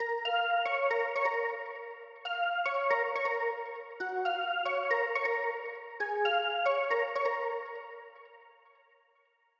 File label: Melody Lead Funk; midi wizard